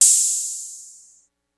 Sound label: hat open hit; Open Hats